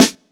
Raw Acoustic Snare Sample C Key, snare drum sound tuned to the C note